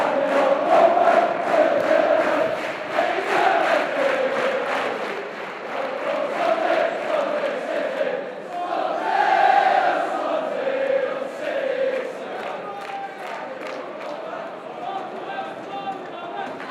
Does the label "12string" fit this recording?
No